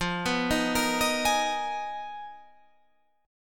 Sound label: G sharp Minor 6th Add 9th F, guitar chord